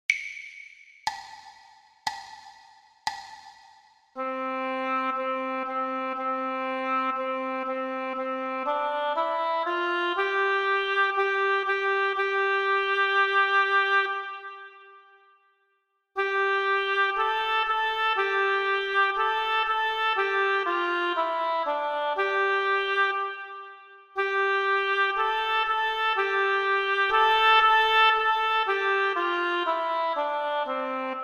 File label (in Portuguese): percepcao ditado